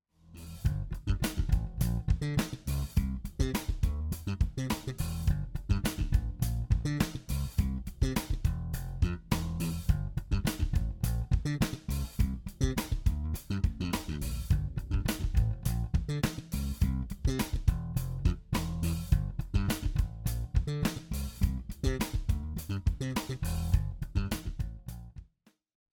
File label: Slap, bass